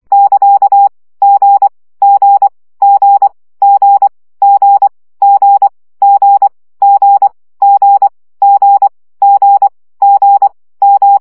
CW gyakorlas g 20 baud

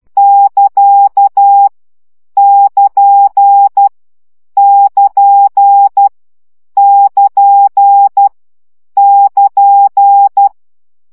CW gyakorlas nyito 10 baud

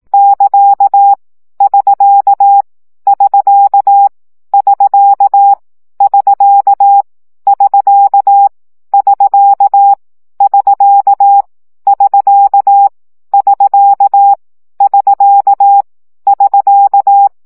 CW gyakorlas sk 15 baud

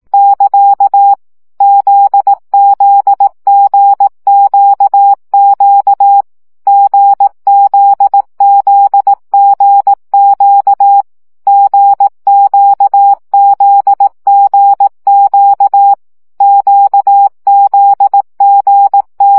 CW gyakorlas g z q 15 baud

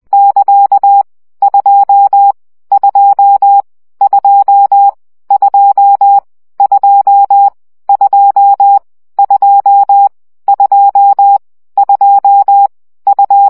CW gyakorlas 1 17 baud

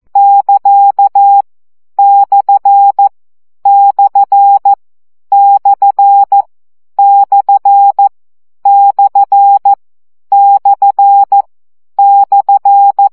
CW gyakorlas per 12 baud

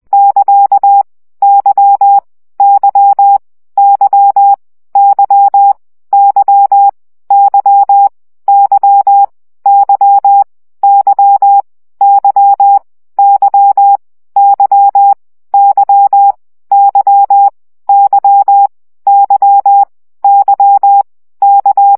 CW gyakorlas y 17 baud

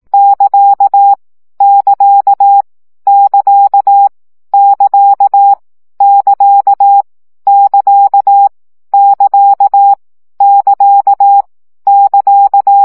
CW gyakorlas ct 15 baud

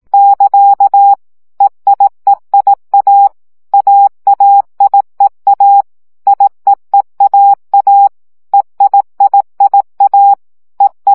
CW gyakorlas e i a 15 baud

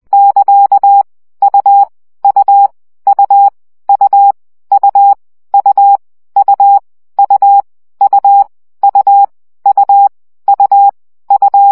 CW gyakorlas u 17 baud

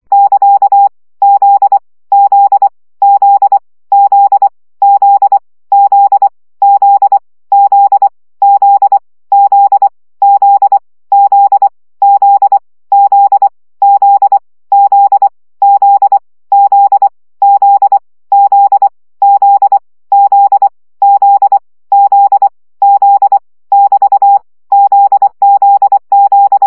CW gyakorlas z 20 baud

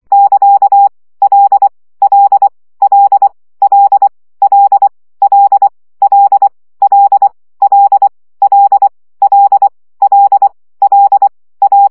CW gyakorlas l 20 baud

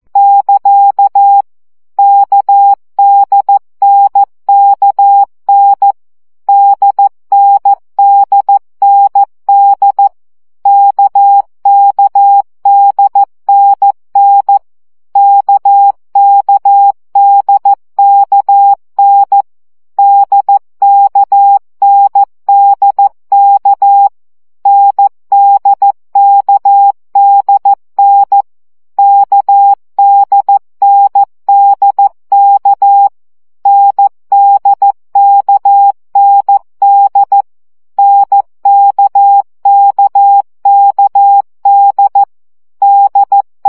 CW gyakorlas n d k 12 baud

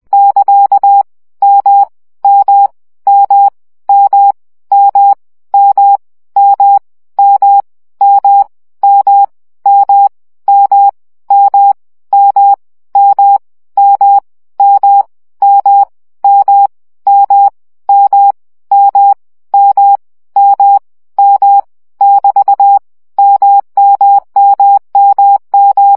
CW gyakorlas m 7 baud

